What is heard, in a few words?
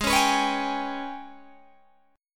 G sharp Minor Major 7th Double Flat 5th, Guitar Open G; 18,18,21,18,20,18